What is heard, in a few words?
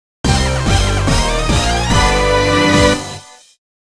Summon Music; YuGiOh Over the Nexus